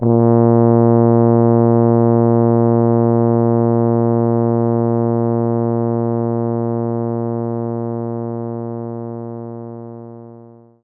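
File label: Tuba A#1; knights try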